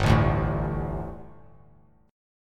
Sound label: G Suspended 2nd G, down, Bass Standard; Gsus2 strummed; JGuitar